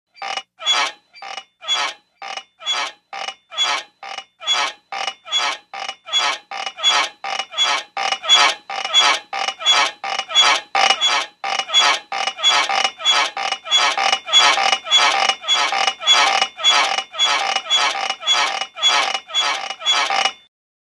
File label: Bed Bed Spring Squeak